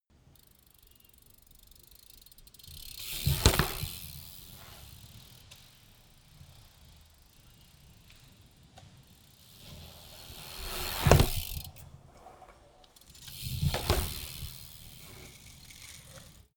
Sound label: bmx maneuver ramp in and out